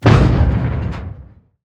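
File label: explosion asteroid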